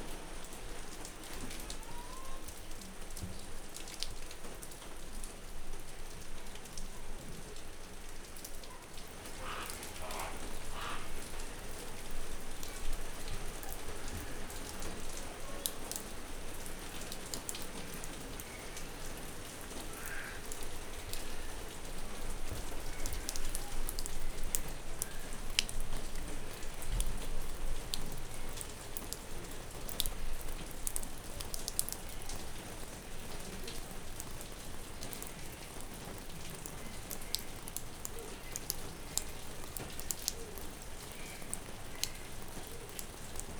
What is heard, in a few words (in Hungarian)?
nagyszemu gyori zoo, eso